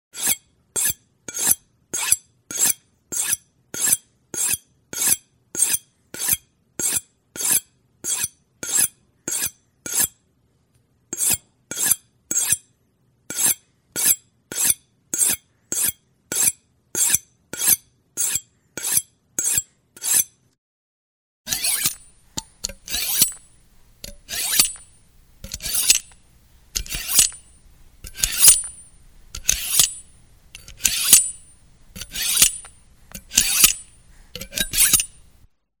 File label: Knife sharping; SOUND EFFECTS1